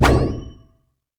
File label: hero alien disc bounce